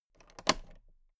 toolbox latch close soft

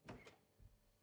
refrigerator door open squeak soft

